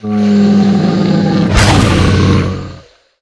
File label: worm striking bridge